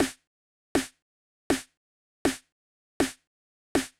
TR909 Snare; hydrogen

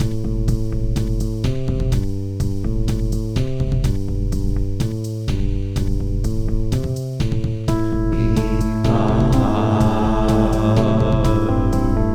rain beat song

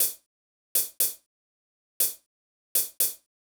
chh lax; hydrogen

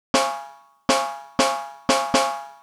Snare; hydrogen

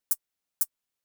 VariBreaks Hat Cl; hydrogen

